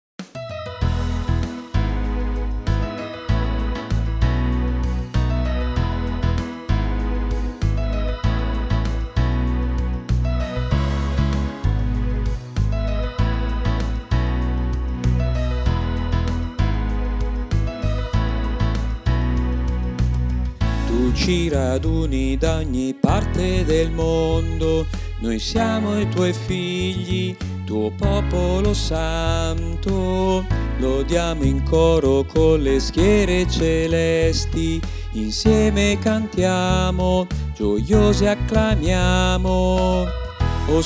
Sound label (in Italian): con gioia veniamo a te bassi